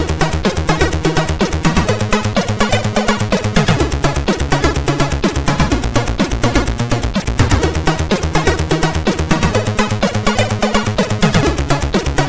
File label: Hip Hop, sid, MOS6581